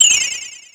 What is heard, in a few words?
star drop; Deltarune